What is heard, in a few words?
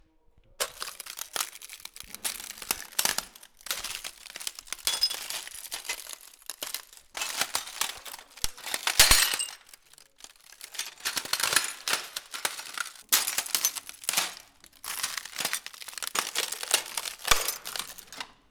Stepping on broken glass; SFX; IRL Recorded